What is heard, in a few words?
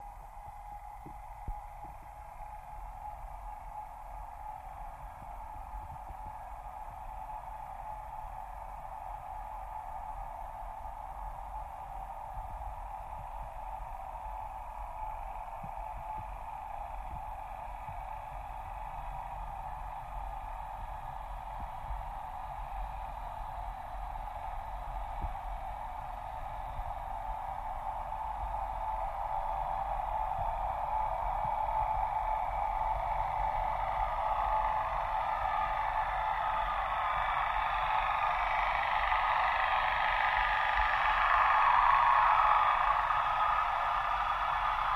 Torpedo Jet By, torpedos